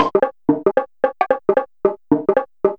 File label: drum loop; super mario paint; yoshi